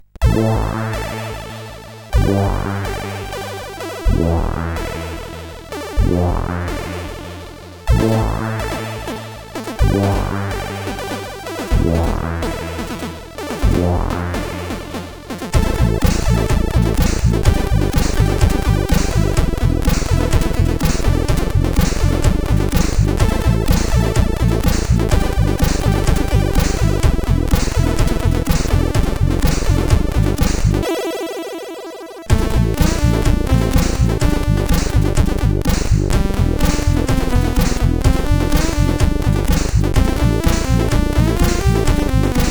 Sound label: acid disco, sid CSG8580R5